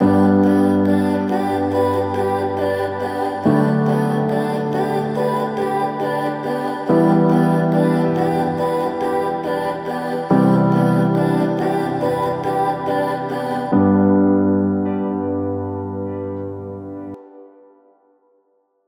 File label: Honor Tone